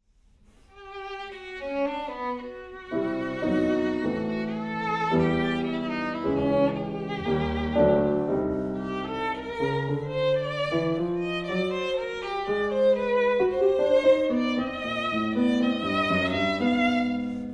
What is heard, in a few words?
melody